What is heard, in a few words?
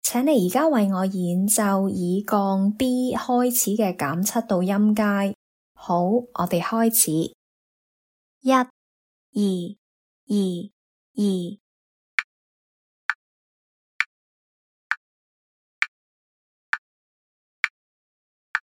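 diminished 7th starting on B flat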